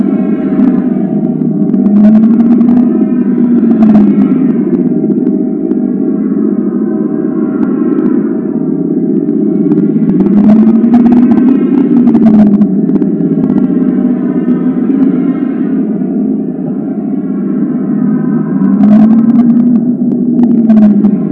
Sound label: city ambience